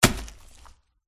orc skeleton attack contact

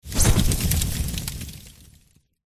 buff in; shankara

